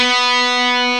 GUITAR, POWER GT

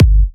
Bassdrum

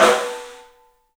STEEL, Drums, ROOM SINGLE; 3D Sound

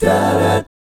GUY CHORDS, Vocal Planet Jazz; ILIO; Vocal Planet VOL-3; Jazz & FX

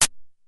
DF-500 HiHat, Drumfire

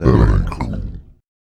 VOICE FX, Dance Vocals

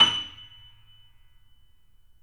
3D Pianos Yamaha C7; E-MU Producer Series Vol. 5

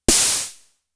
Snare, Drum Machines; Fricke Schlagzwerg

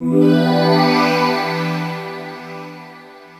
chord, 145 BPM, drum & bass; Ueberschall; Drum & Bass Flash Trackz

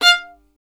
STR VLN, Solo Instruments; Miroslav Vitous

